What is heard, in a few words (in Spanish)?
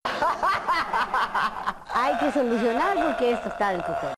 risas